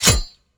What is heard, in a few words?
ui hud unlock camera release; LoL